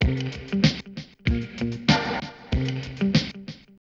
BEAT FX, Hip Hop; USB Soundscan vol.34; AKAI

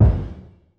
hit, Roland MC-909

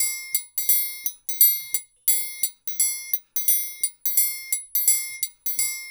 percussion loop

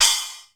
XE8 CYMB, drum; Crypton - DrumBase5000

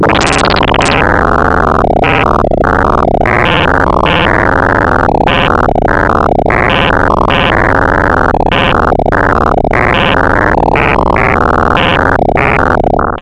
ARP 2600, SEQ; TECHNO-BOX

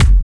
Kick, Kickdrum; Equipped Music; Loungin House

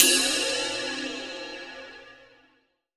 MIX RID, DRUM RYTHM; AKAI S6000 CD-ROM - Volume 1

